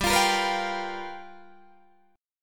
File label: G 7th Sharp 9th G chord, Guitar Open D